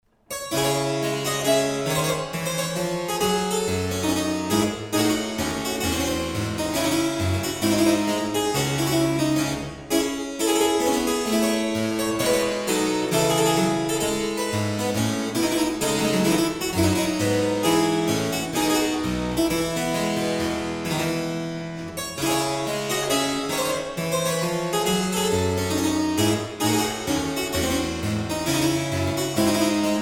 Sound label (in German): cembalo